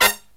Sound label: low chord, brass riffs